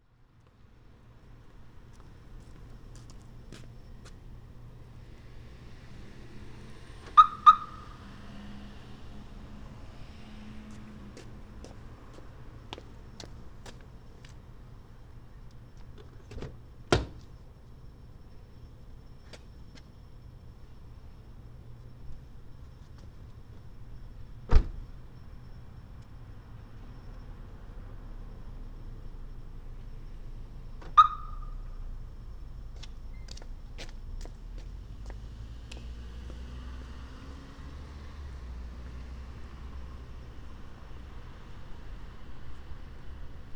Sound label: alarm